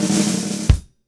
100, Mediteranean Traditions; Soundscan vol.53; AKAI

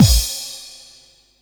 KIT, Dance Mega Drums; Best Service

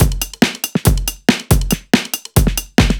Kit 7 Odd Sig 140; off the grid

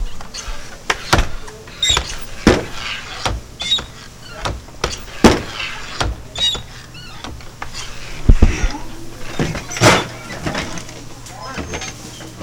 swinging door; E-MU Producer Series Vol. 3 – Hollywood Sound Effects; Human & Animal

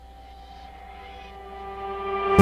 FARFISA ORGAN; Sonic Reality; Vintage Timetraveler

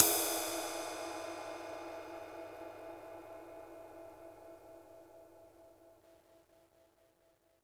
flat ride; JazzFunkKit

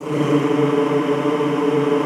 D2, Classical Choir; Best Service; Extended Classical Choir

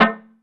One Shot Perc, Drum N Bass - Percussion; Sonic Foundry (Sony Creative Software); Knutrix Drum & Bass

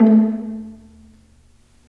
STR PI, Roland String Master Series, Vlas Pizz

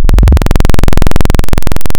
Polynomials, wavetables; Architecture 32

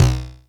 Stabs, Elektron MachineDrum SPS1 MKII

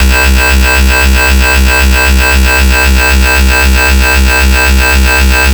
FS (C2), Synth; neuro; Stanza; FightSynth Multi

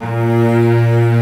CURT CELL; AKAI S-Series CD-ROM Sound Library VOL-7; CELLO+VIOLIN

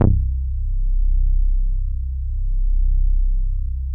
MOOG TAURUS C1; Big Fish Audio - First Call Vintage Keyboards

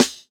Snare, jungle breaks drum sounds

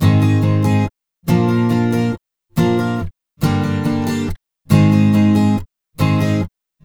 Acoustic Pop Guitar 140BPM Cmin; Vengeance - Essential Clubsounds Vol.4

